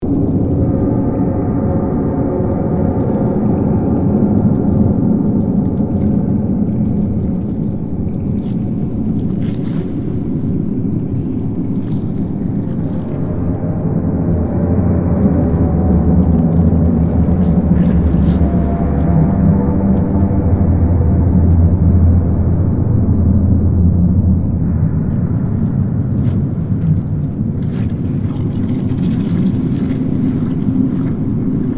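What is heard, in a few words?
Ambience Distant Corruption Quad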